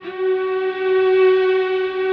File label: breeze, guitar pads; Spectrasonics; Bizarre Guitar